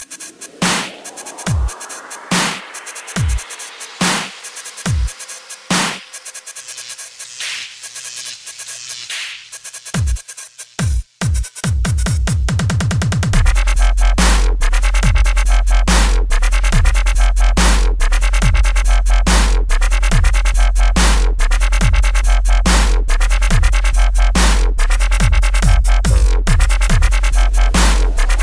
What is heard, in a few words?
Techno